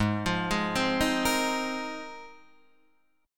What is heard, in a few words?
Ab 6th Add 9th Ab, Guitar, Open D